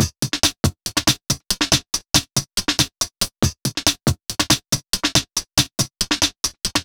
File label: Breakbeat 140 BPM